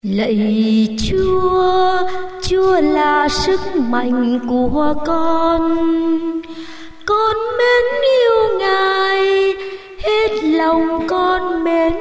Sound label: sop tenor